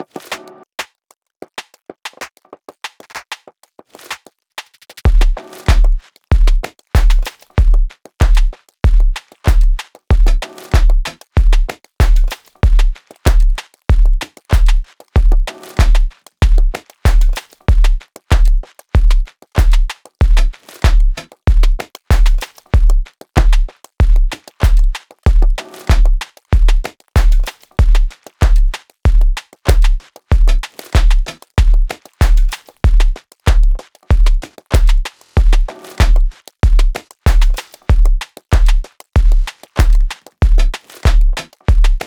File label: Music DRUMS; CP Music Biome2 DRUMS B Phase03